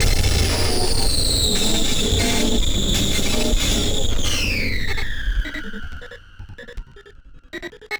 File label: Battered Synth; malfunctioning synth